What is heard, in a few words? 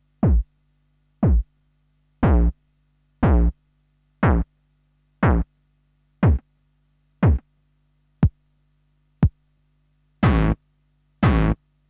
Kick Drums; Kniteforce; 1000 Samples Volume 1